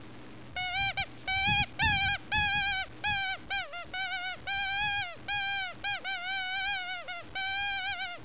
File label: Mouth Squeak